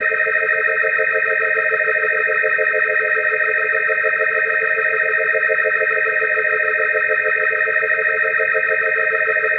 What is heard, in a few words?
Organ A Dim+8va; Dance